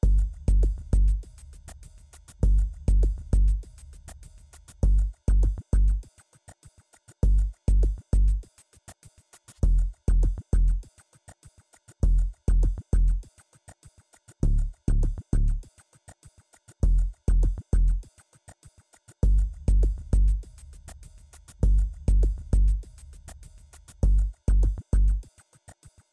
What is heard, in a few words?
Quest Base Loop; bakugan champions of vestroia 2020; switch gamerip